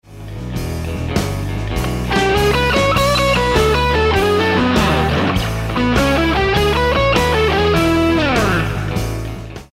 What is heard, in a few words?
Lick, guitar